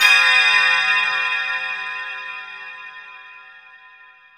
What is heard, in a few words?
CLOC; Back In Time Records; Big Bang